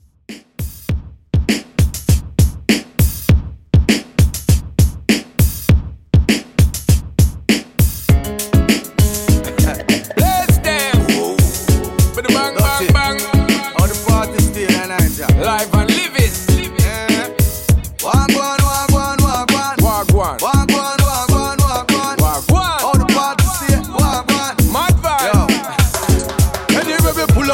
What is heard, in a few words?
Redrum 100Bpm